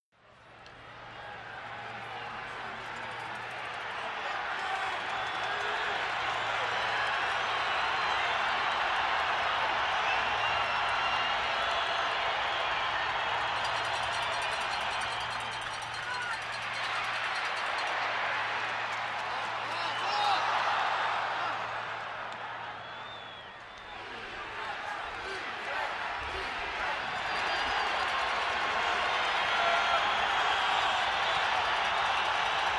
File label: Football Crowd Exci, sports arena football